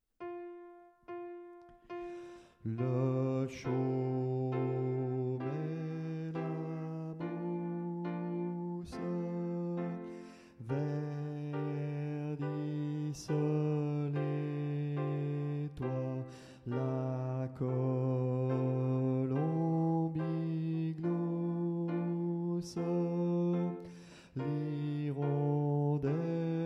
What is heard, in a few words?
alto